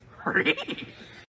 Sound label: rehehehehe